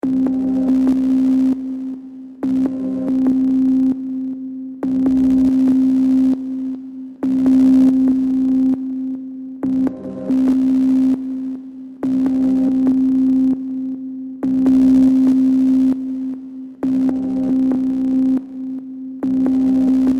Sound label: Space Signals, Futuristic looping space signals.; Communications (Sound Effects:Science Fiction)